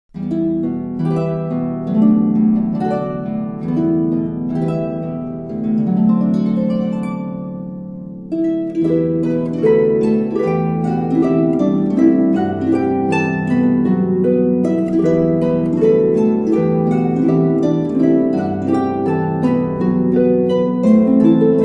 god rest ye merry gentlemen, christmas carols on the harp